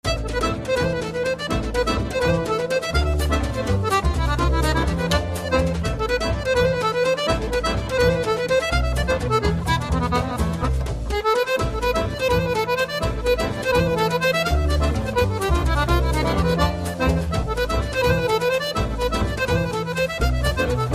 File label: Musette Waltz, String Quartet